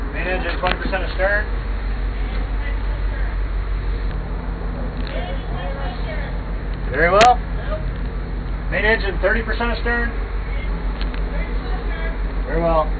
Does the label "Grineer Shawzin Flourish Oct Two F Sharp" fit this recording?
No